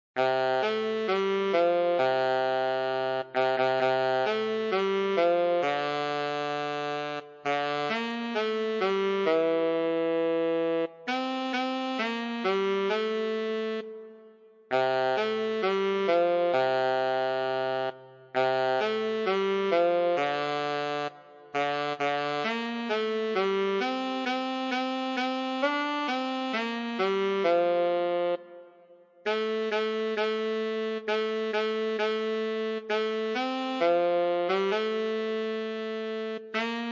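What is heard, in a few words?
solo tenor saxophone; Jingle Bells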